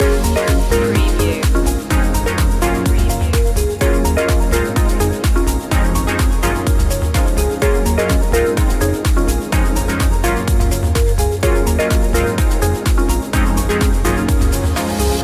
project positive loop

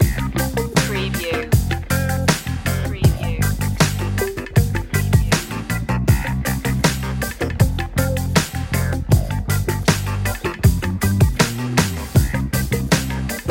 groovy jamaica loop

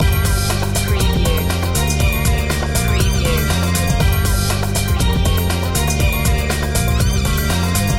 ethno breaks loop

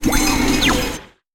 sci-fi display switch

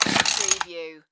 Hedger Pull No Start

